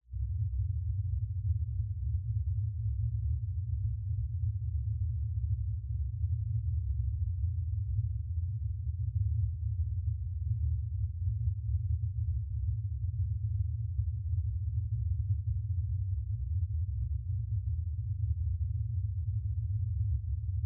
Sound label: 60-120Hz Noise